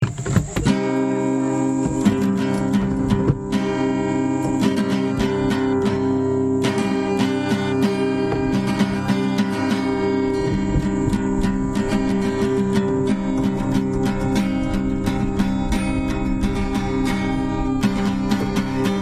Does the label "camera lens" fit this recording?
No